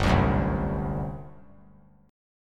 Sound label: G Major 7th Suspended 4th, G, down; GM7sus4 strummed